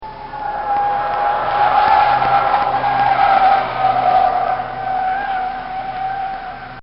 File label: A Volvo Skidding; Cars; Vehicles; Bobby Cole